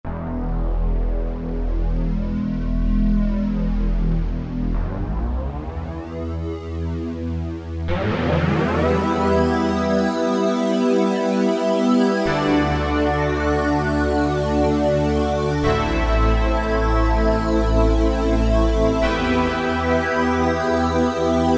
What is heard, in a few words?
Mondo Chord, Roland SR-JV80-01 Pop